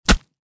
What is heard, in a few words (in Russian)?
udar v telo; Звук ножа; Звук метания ножа